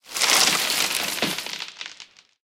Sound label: tree twist; tanks; War Thunder